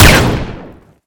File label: type; garrysmod